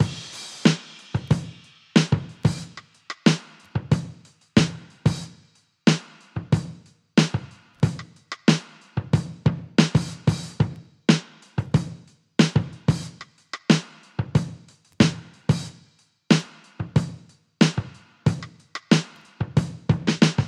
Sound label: 92 bpm drum loop e key, kick tuned to the E note